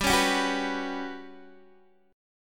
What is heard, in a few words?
G Major 7th Flat 5th, Guitar DADGAD